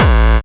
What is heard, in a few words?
Bassdrums Hard Kicks; Vengeance Samples